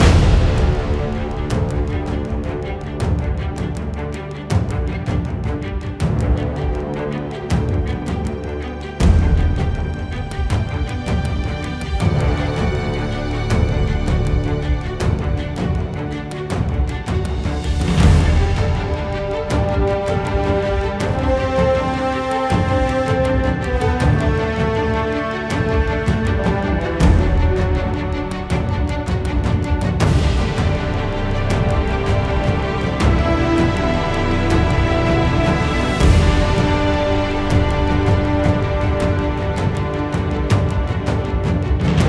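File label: Music Orchestral, Orchestral Loading 80bpm; Forza Motorsport 6 Apex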